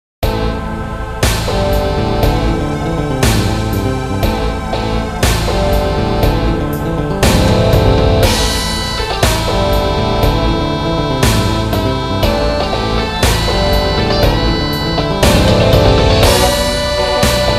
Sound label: guitar